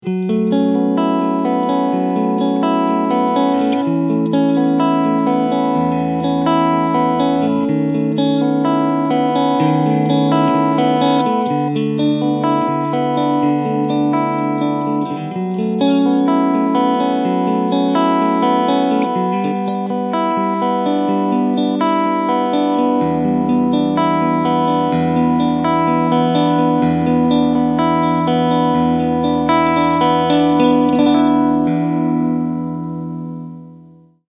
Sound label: Clean Pos. II